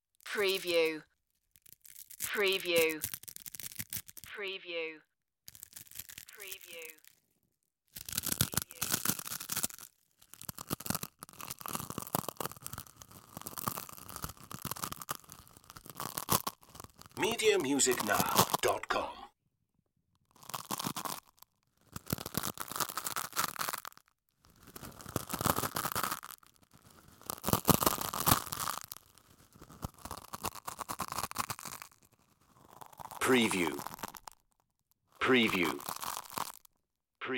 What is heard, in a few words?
crunch